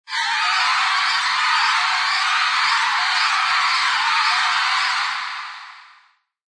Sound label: Alien Shrieks, Alien shrieking, birds, sci-fi, scary, FX from voice, (human voice)